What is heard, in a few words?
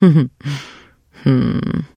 trader sigh; 商人杰恩（七日杀）